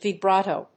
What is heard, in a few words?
vibrato